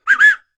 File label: New Whistle; Roland BK-5